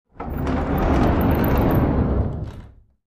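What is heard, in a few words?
Dresser Rolled On Wood, scrapes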